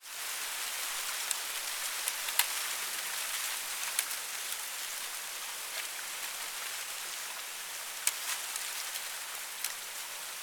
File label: nature wind breeze palm trees water canal